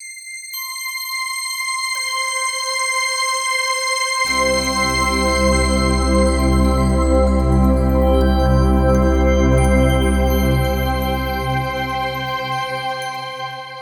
Signature Sound; FXHome; Signature Tracks

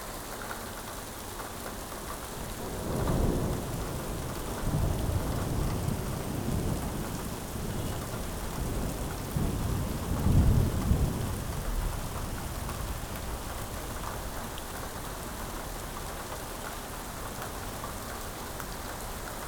distant thunder; 99Sounds; Rain And Thunder

